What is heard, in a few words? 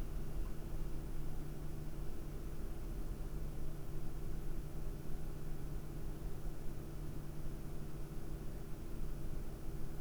high speed 1000 RPM; BQ Light Wings